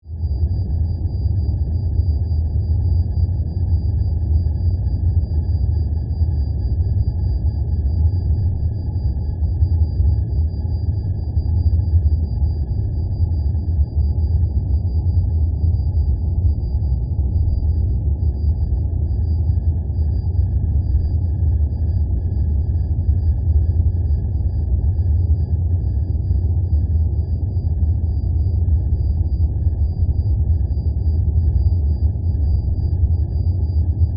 Drone Spaceship, Interior, Hallway, Power, Source, Flutter, Scifi; High, Pitch, Ring, Science Fiction; Spacecraft